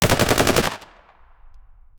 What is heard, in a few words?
naval artillery multiple